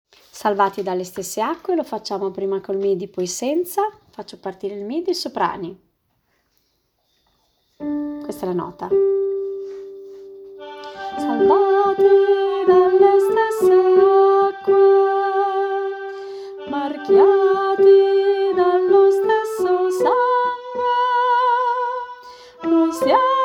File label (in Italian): Salvati dalle stesse acque soprano; coro diocesano